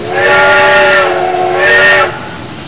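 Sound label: LOCOMOTIVE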